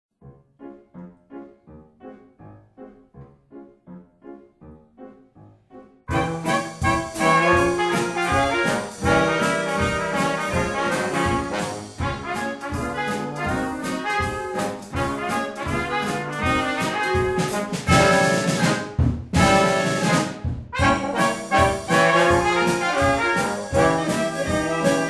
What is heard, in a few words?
Maple Leaf Rag